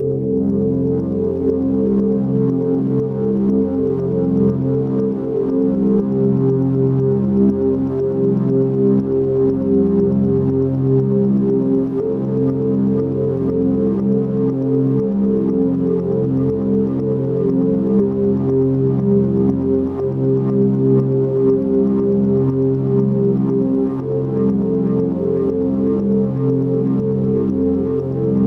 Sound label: pretty drone